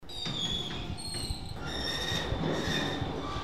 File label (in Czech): Zvuk vozíky